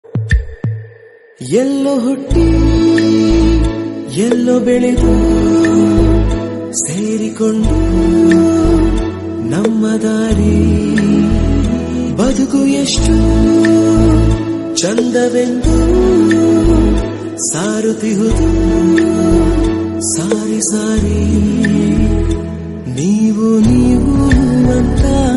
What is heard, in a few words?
kannada movie song; jolly days